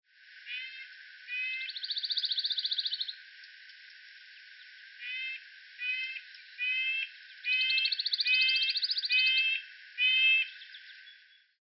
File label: Red-breasted Nuthatch